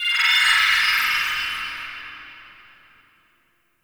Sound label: High Tech, Ambience; Guild; Warframe; Misc Remaster